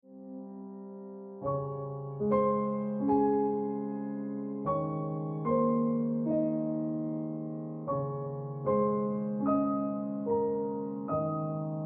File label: Piano Music